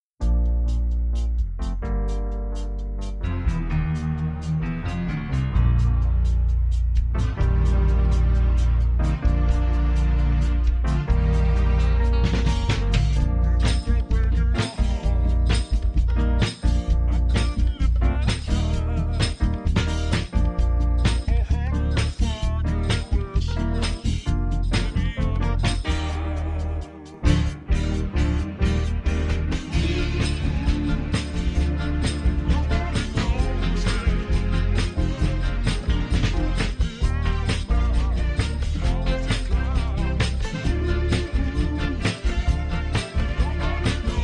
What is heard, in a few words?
ACCOMP in Bb